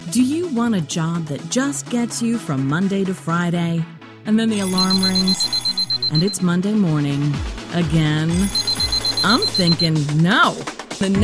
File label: Alarm Clock; CSB 30